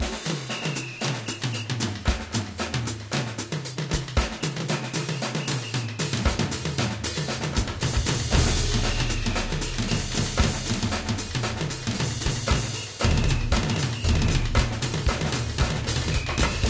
Music; Diner Dash 5 Boom

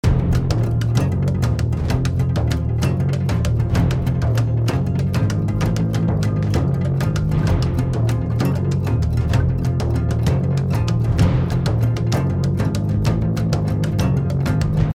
Battle Loop; Infinity Blade 2

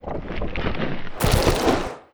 worm

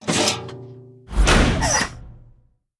shield; Animation Throwdown

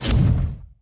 puff smoke

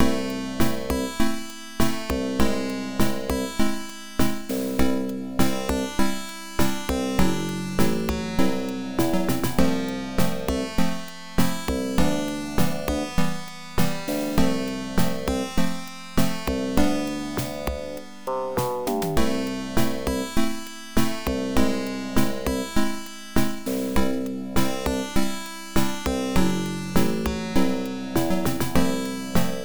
Drama (Start); Adventure Tsukuru 98; PC-98

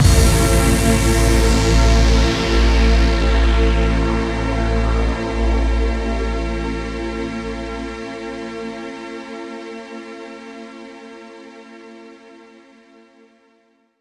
Music One Shot; gamerip; Zombie Army Trilogy; PS4

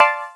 zinger; Zombie Rollerz Pinball Heroes

